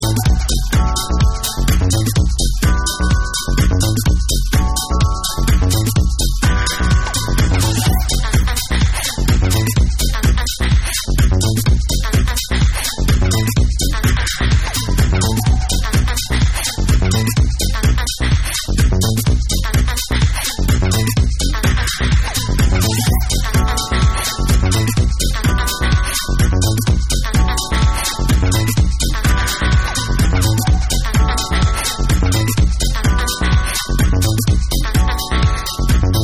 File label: GET UP Instrumental